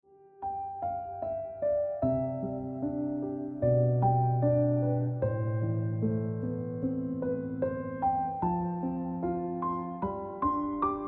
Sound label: Ringtone Piano Music